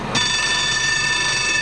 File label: Adjust; MDmot